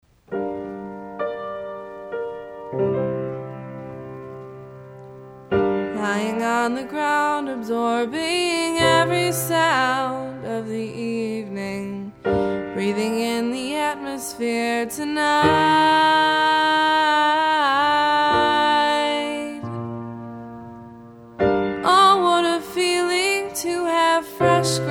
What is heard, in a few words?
A Nearby Piano